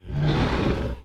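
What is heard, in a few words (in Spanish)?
CERDO INHALAR, pig inhaling; yesterday origins gamerip 2016 switch ps4 pc ios macos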